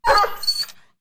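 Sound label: wolf, monster; lang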